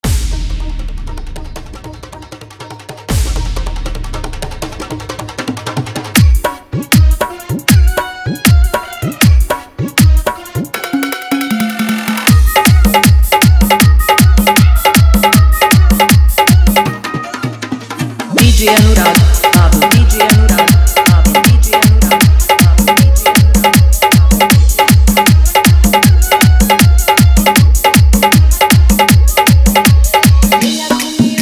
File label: Navratri Bhakti Hard Dhollki Mix